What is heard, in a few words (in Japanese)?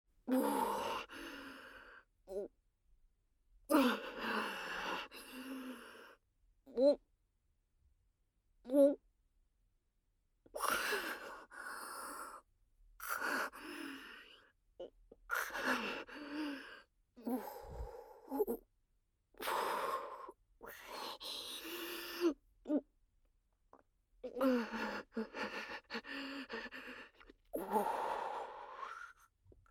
オホ声喘ぎ, ASMR